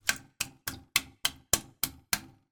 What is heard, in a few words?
household toilet brush bang in side of toilet multiple